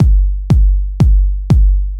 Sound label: kick layer